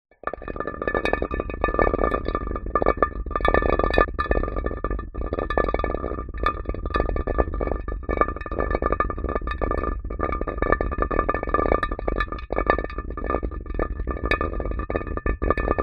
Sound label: foley glass object heavy round roll concrete; zapsplat